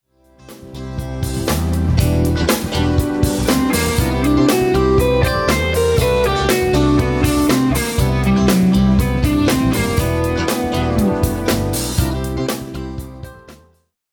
Fretboard Navigations, Guitar

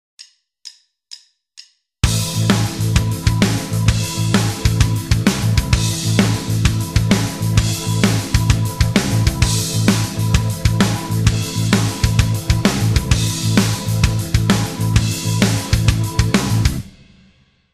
backing; In The Woodshed ex4; Guitar Techniques 2017 Issue 266 March

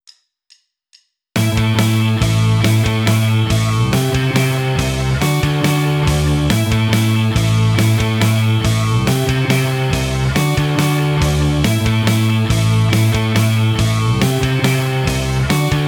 Rockschool, Guitar; Guitar Techniques 2018 Issue 289 December

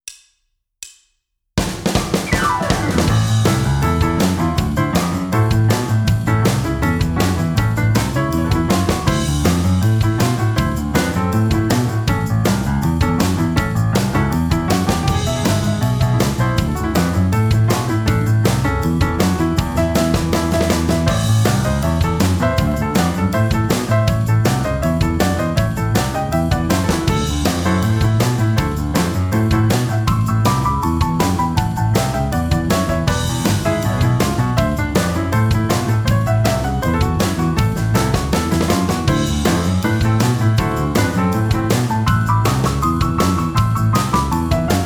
Rhythm Guitar

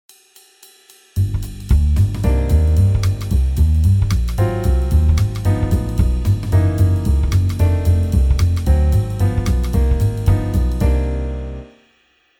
Jazz backing, Guitar; Guitar Techniques 2017 Issue 272 August

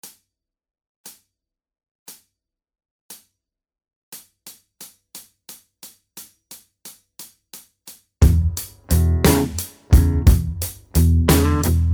Blues backing, guitar; Guitar Techniques 2018 Issue 289 December

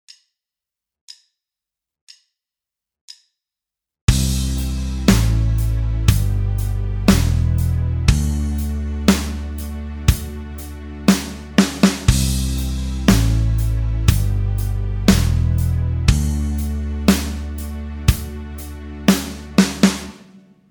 backing; In The Woodshed; Guitar Techniques 2017 Issue 274 October

